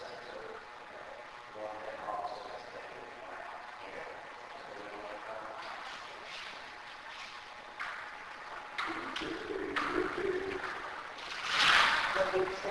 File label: Lobby unknown; Case 012 Pocahontas Fuel Building 1st Floor